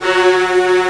orch 1, Akai MPC2500

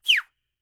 WHISTL; Spectrasonics Vocal Planet; Jazz FX

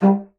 bassoon G3; C BASSOON; Xsample VOL-6

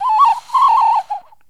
BIRD, bird call; Greytsounds VOL-1; BIRD CALL FX

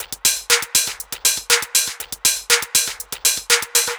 Combo Hat

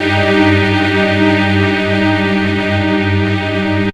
tuners

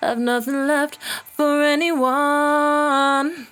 68bpm D, Velvet Vocal; Zero-G

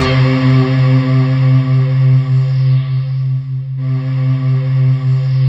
C3, SynthLine

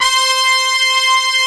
S BRASS C5-A, FUNK

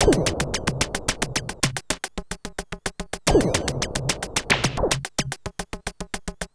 Beats, Industrial

